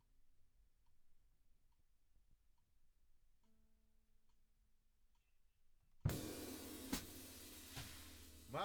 Drums

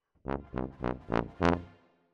Tuba, jazz for piano

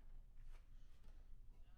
Bass Room

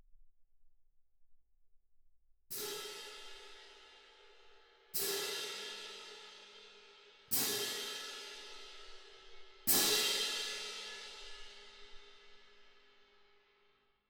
Twin R Perc

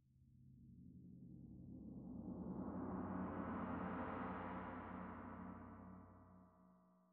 Tam-Tam Front

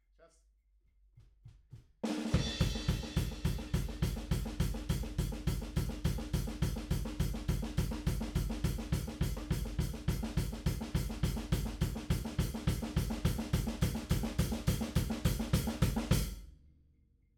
DRUM

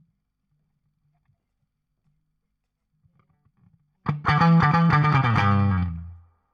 Guit Solo Back

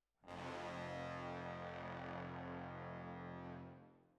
Hall

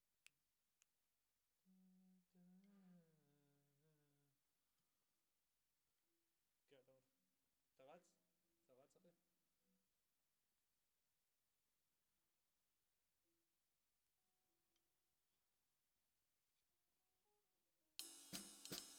SNR

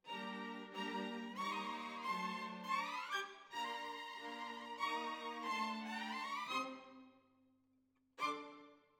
Violins Over

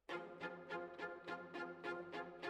Violins, jazz for piano

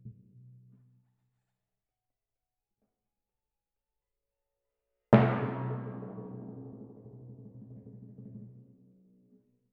Twin F Timp

